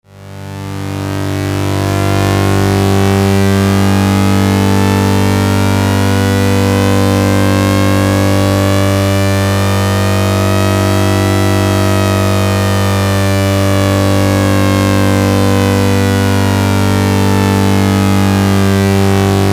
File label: dual TH4046 VCO, modular